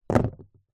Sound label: office scissors down on empty cardboard box